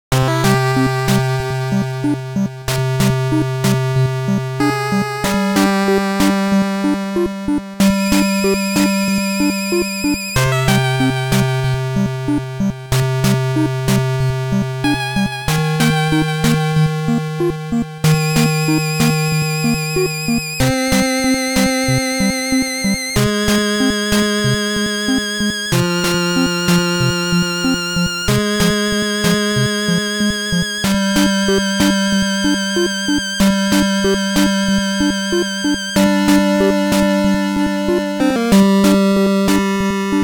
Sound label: Tune; Loopz; NES